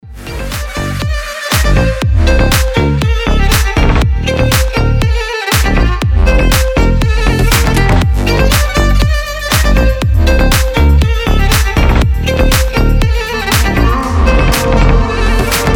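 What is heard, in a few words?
violin, oriental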